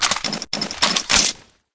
p228 silencer off; weapons; kuilu

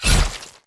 knife hit; weapons; kuilu

